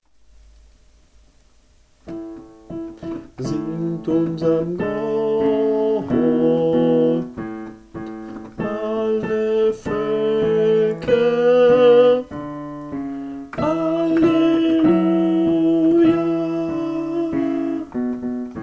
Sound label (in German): Oberstimme; Singt unserm Gott